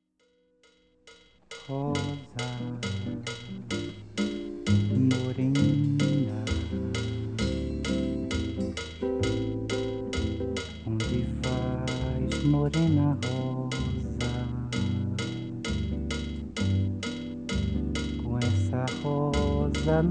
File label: bossa